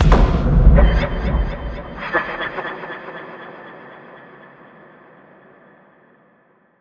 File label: Dungeon Crawler; Ghosthack; SkyRealm